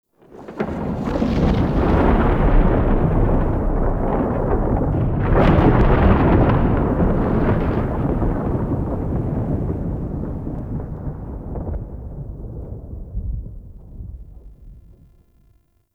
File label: Murmur Exterior Rolling Thunder F; Warframe; Ambience; Duviri